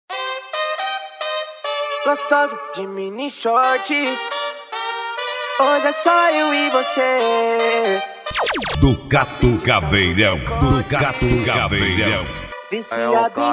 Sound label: funk mega bass